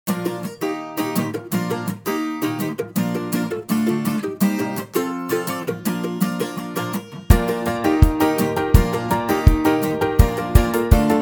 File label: Rythme beat